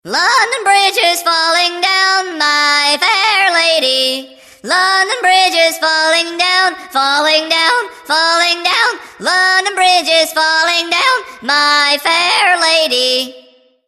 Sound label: Funny London Bridge